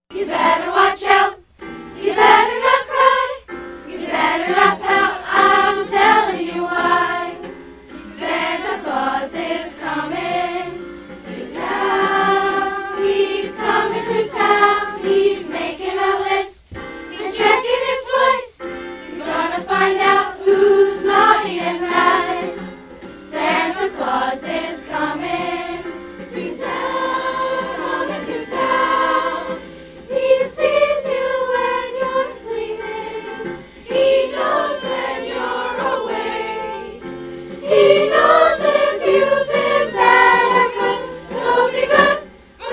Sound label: Middle Choir Santa Claus